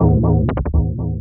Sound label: Designed FX Hit